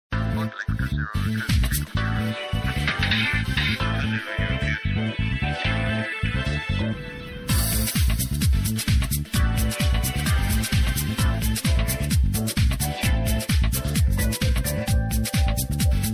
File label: Airport, Ring tones; LG GD910